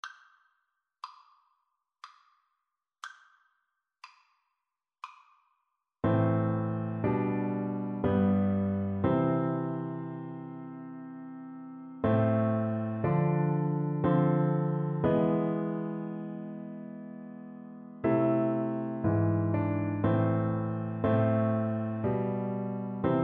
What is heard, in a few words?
bach come sweet death FL kar, Flute